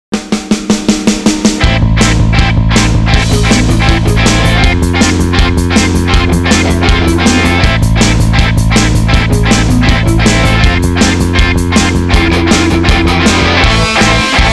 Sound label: Energetic Indie Rock; Royalty free music For Video Youtube Montage Films Confident; Mantra Studio